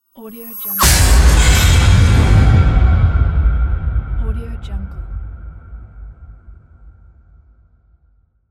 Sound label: trailer horror sound impact